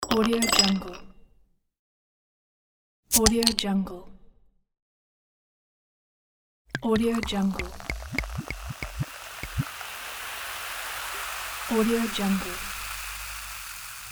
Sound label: drink coke sound pack